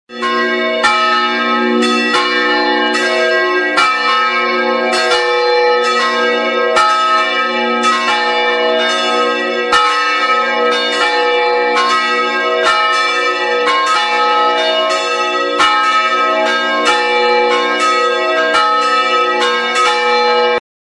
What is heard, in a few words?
Church Bells